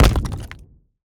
rock burst; battle; CrossCode

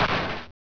low crash, mad cars

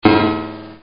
sound paddle

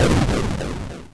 land; doublespeakgames

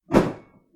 swoosh

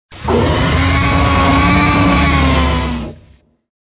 roar; adventurecat; peacefree

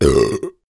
burp

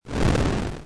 fire; doublespeakgames

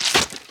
Ant squash; 2fast2curious

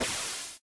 jetpack

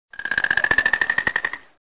frog croak; Trijong

